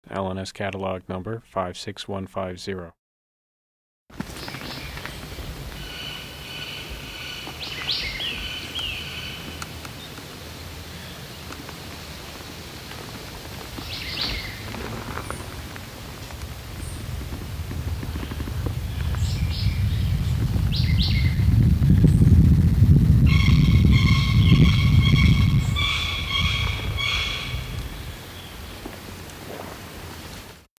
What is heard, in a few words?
black billed parrot